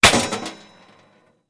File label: Bullet Impacts Metal